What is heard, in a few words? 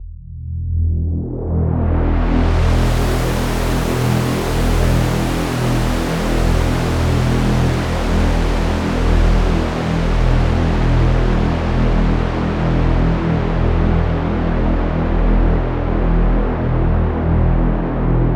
reaktor sounds, unisono extreme 333 voices lp4